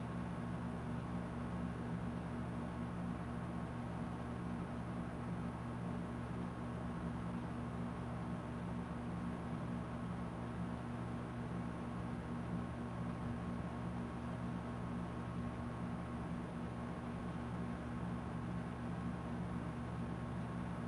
ambience cot air conditioning running slight pulsating feel from ac unit as it runs; pressure; fvtt sonniss gdc audio